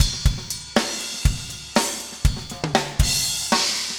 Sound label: funk 120 bpm, loops